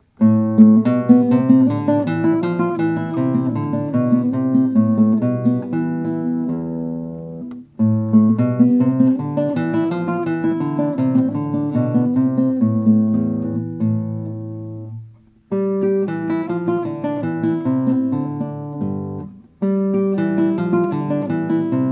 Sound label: octave study; sagreras julio